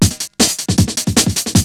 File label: BREAKBEATS, TECHNO